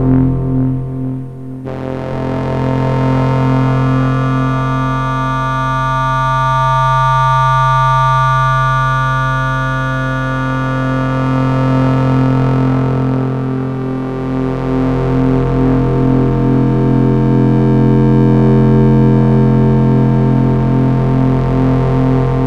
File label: Neutron Drone; Behringer; Counter Intuitive